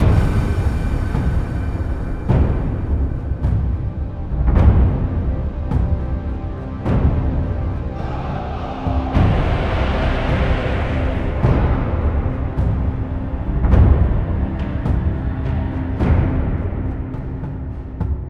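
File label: portal ambience; conan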